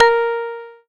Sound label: PLUCKED, Synclav FM, A#; Big Fish Audio